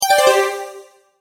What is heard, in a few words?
multimedia tone alert